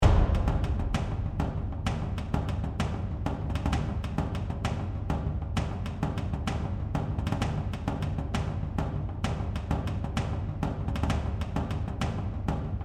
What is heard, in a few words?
Longbow Drums; The Lab; valve